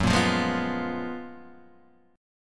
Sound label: F sharp Major 11th; F#M11 Chord {2 1 3 1 0 1} strummed